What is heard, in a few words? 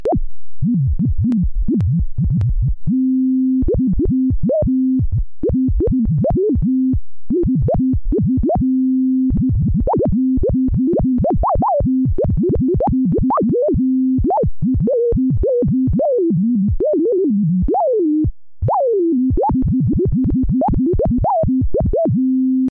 Noise DJ Scratch